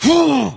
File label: troll wound; Halazzi